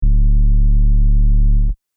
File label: Grimey 808